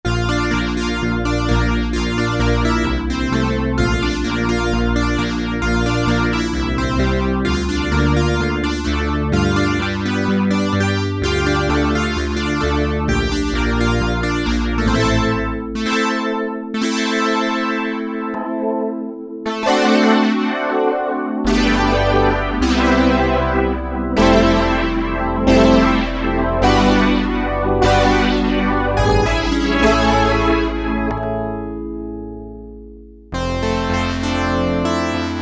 Playing with effects, Korg PolySix; Tweaks

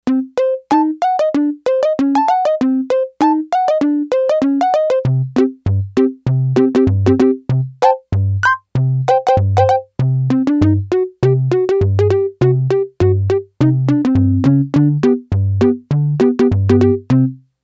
Sy.Perc., Yamaha DX11; Factory Preset Voices

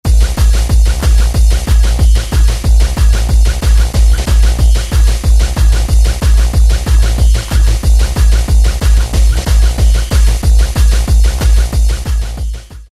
Trance Pattern, Roland MC-303

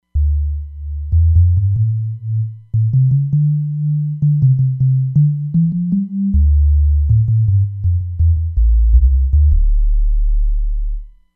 Sub Sonic, Roland Fantom XR

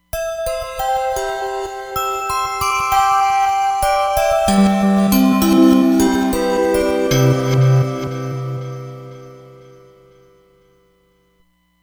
Clockworx, Roland Fantom XR

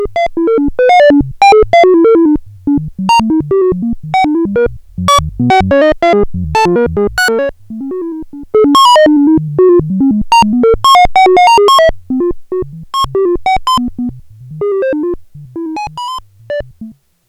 SPACE SOUND II, Roland SH-101; Factory Patches

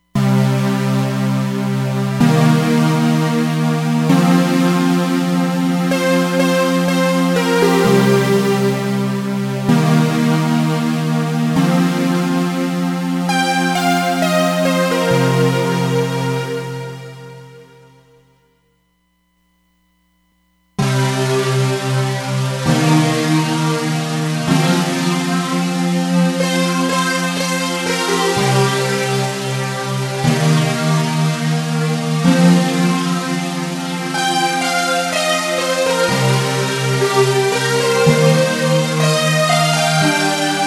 Flange + Double, Roland DEP-5; Factory Patches

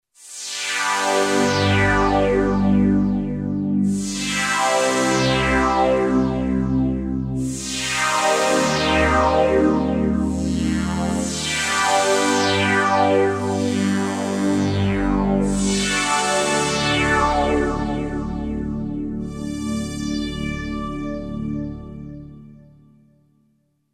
HP SWEEP; Akai SG01v

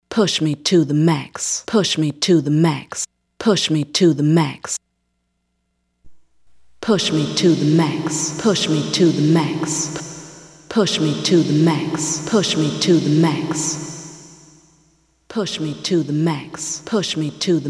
Smooth Plate, Roland SRV-3030; Preset

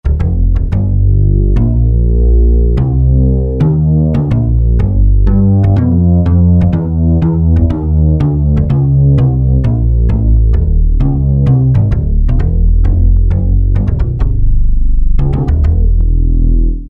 Big Upright; Factory Preset examples

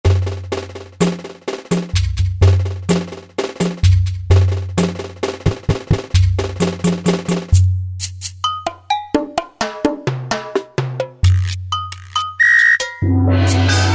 JV rhythm; World; Roland SR-JV80-05

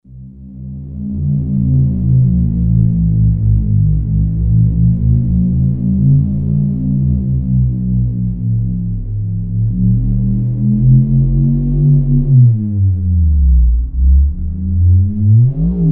Alien wind unison, Sequential Circuits Six-Trak; Factory Programs

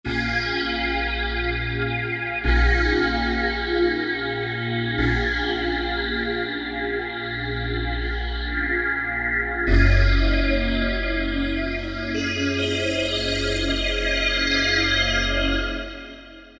Ppg Pad; Generalmusic Equinox

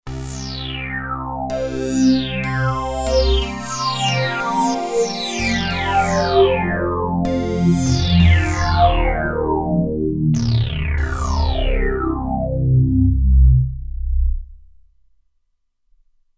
Sweep Down, Roland Alpha Juno-2; Factory 2

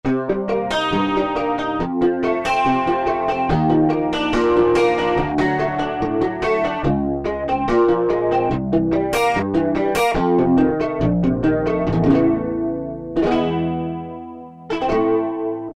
PickGuitar, Korg T3

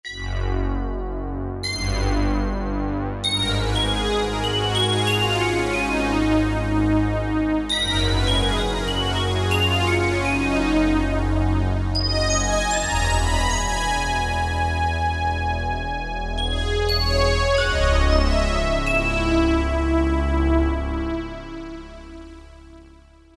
SpaceSolo, Generalmusic S2R Performance

